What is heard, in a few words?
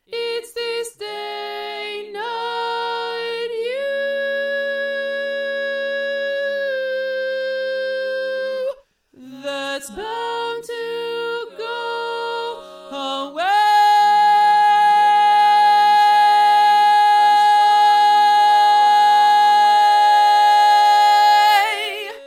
tenor